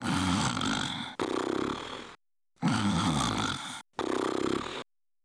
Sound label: SNORING